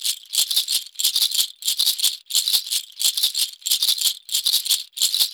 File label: ETHNO PERCUSSION 90; ethno2